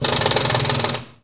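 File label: Impact small, air tools, industrial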